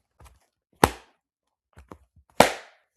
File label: Kindle